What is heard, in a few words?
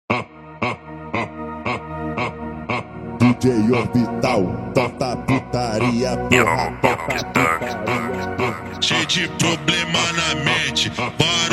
Dead Violin Funk slowed